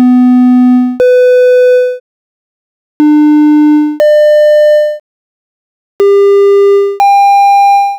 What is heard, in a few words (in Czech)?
Oktavy